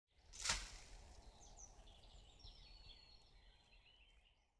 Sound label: forest motion, Night; FontainebleauDemo